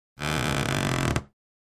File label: Creak; crashes & creaks